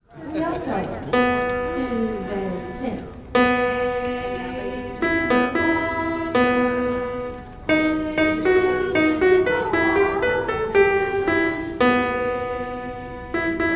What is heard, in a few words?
alto